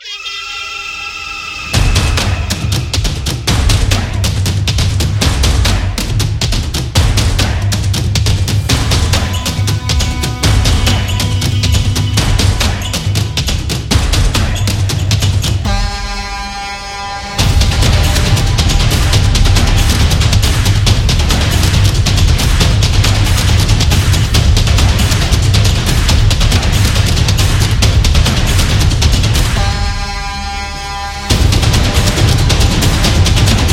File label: Sport Commercial